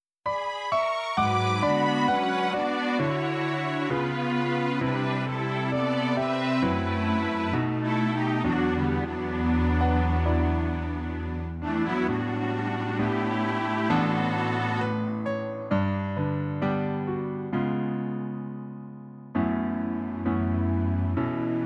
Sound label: Piano Ver